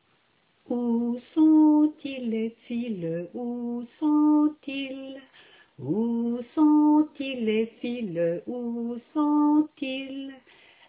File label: Vocal